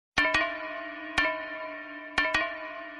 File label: Notification; Kaithi